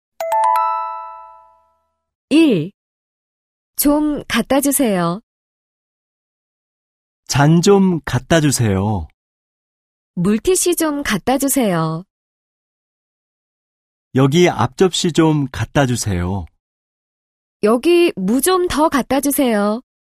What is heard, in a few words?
K-POP